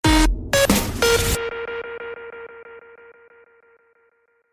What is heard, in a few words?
magic text tone